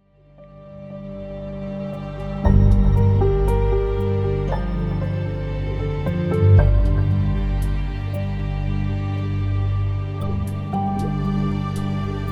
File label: dark mysterious with subtle rhythm, Music; Fash Video Music Demo